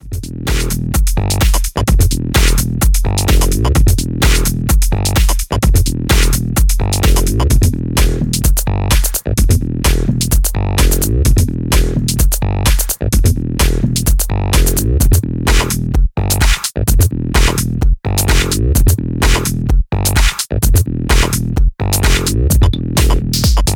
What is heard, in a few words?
tech house